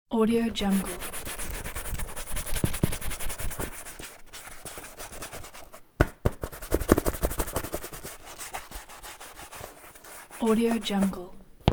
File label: shoes polish cleaning